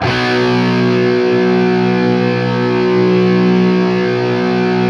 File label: 5TH B looped, Guitar; East West; Steve Stevens Guitar Samples Collection